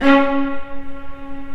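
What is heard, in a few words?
Viola C#3; Digital Sound Factory Virtuoso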